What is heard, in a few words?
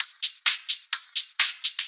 Hihat Loop 128 BPM